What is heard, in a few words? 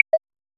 japanese game ui button